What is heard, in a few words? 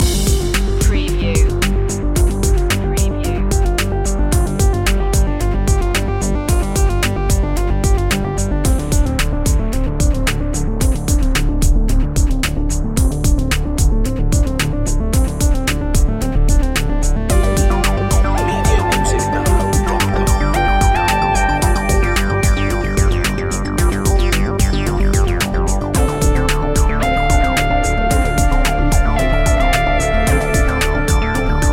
future technologies loop